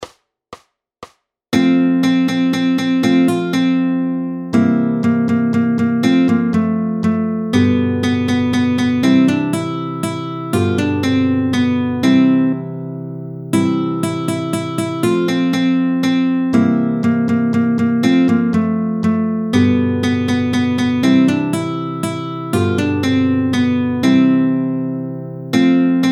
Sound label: Menuet; Super Kytara Klasika